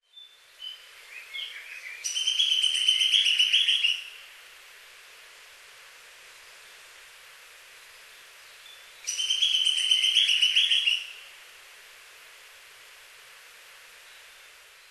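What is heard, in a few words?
Lesser Cuckoo; ホトトギス; 地鳴き; 岡山県北部; 梶本恭子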